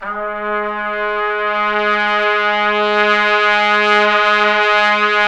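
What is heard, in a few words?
Brass Orch Ensemble; Roland L-CD702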